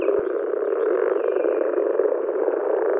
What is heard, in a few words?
Moor Frog